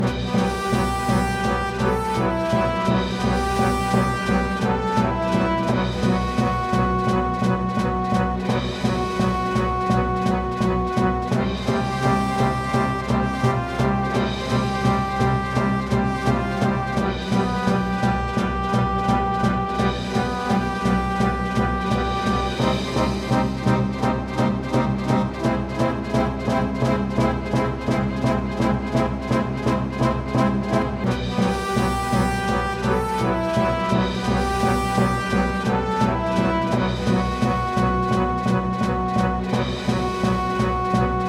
Background; Widget's Odyssey; PSP

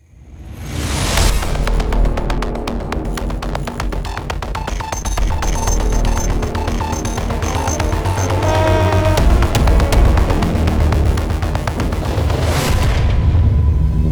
Cinematic Score; Rad Rodgers Radical Edition

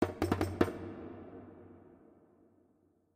chimes drums; gamerip; Heretic Operative

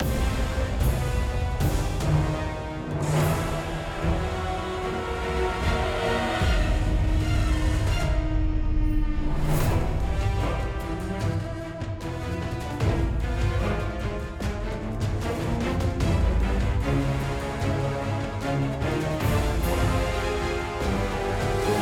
Battle Boss; Kargalgan Phase 01

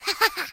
upgrade action; Ginger

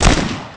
Rifle; MilkChoco

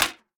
Flipper activate; Blastmorph Pinball; xbox series xs

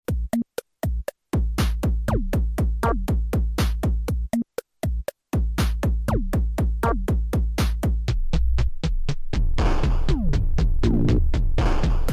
pinball song

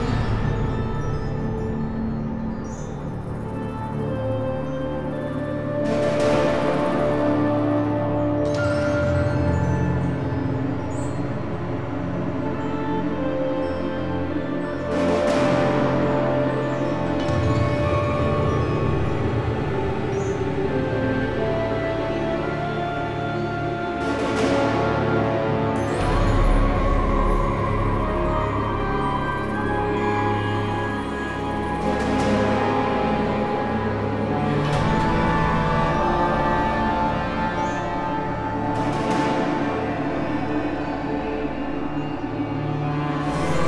intro countdown; heroes of order chaos; android ios mobile gamerip 2012

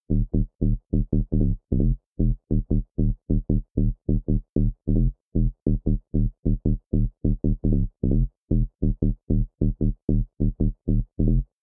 Bassline; chippy

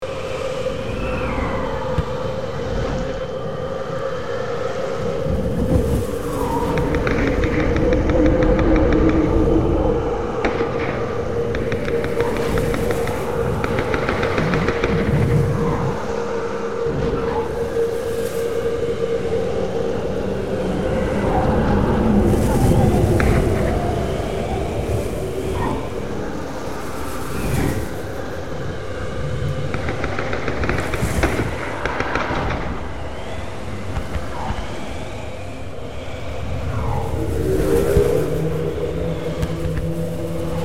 Amb Blizzard Uni Combat

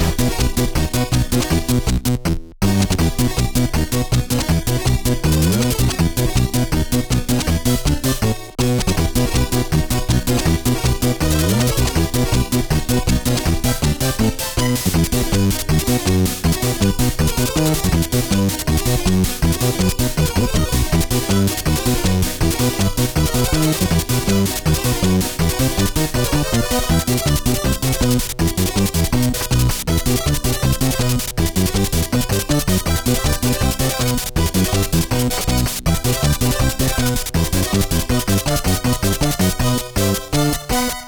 music miniboss orcs loop; Skellboy

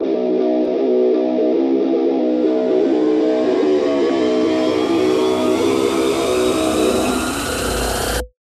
Music Song Intro; Hellbound

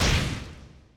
Janken Slash Fire

